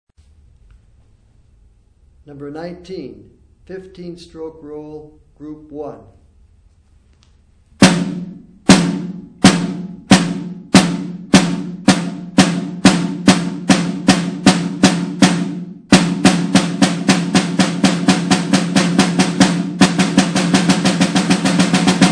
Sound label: snare rudiment